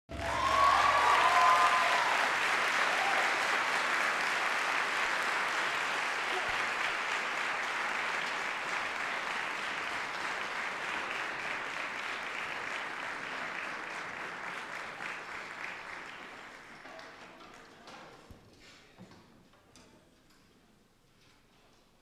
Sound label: Applause